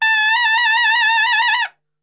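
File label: A trumpet shake; Best Service; Brass Super Section; TRP SHAKE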